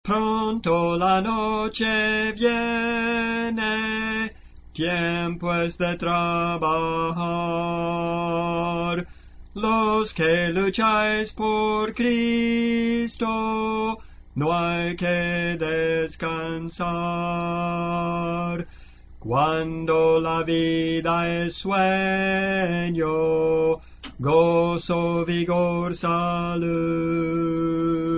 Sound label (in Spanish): Contralto, Cantos Espirituales; Clase del Canto